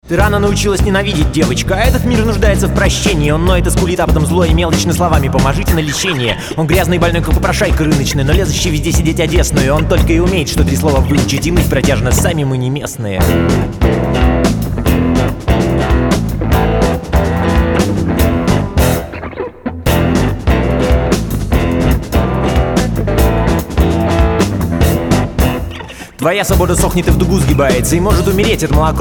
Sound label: viol